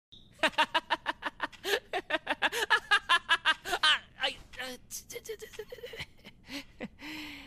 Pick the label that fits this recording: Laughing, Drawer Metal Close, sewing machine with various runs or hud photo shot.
Laughing